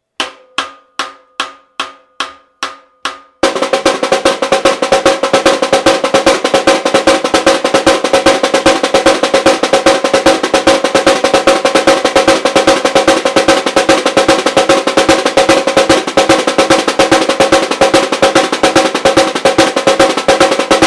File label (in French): batucada caixas caixa 144 bpm peu de variations